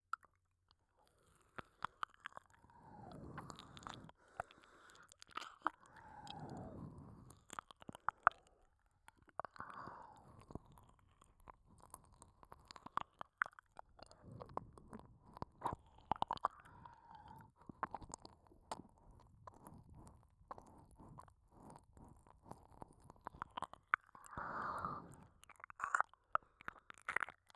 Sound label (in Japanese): 耳舐め